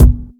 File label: loud bass drum single hit a sharp key